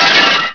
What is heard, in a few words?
glass, debris